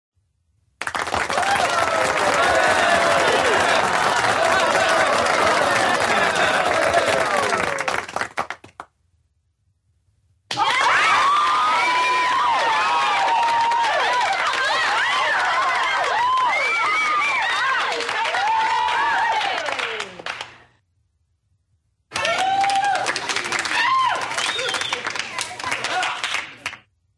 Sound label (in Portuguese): som de aplausos viva eee alegria gritos palmas plateia feliz sound applause